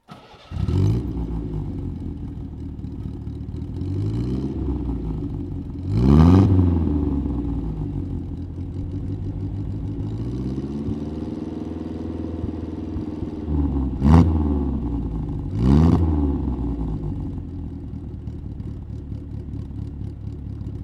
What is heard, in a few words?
Facel Vega Facellia; Facel-Vega Facellia F2